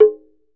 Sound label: TUNG HI, Tongue Drums; E-MU Producer Series Vol. 7 – Old World Instruments